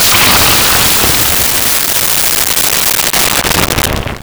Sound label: object dissolve in goo; props